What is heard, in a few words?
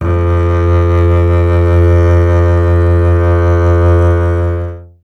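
String contrabass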